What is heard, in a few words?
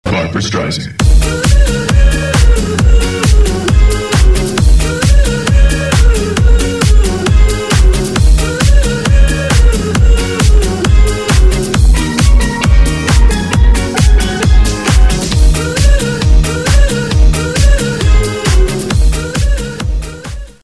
surf